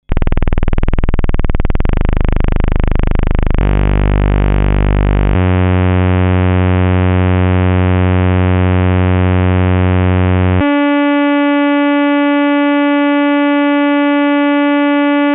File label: polivoks